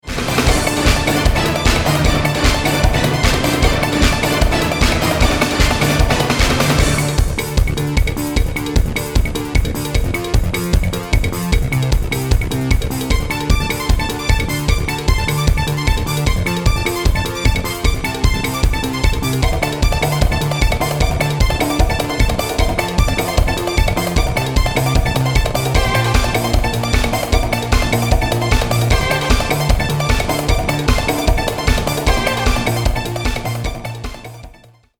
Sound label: hyperbeat, club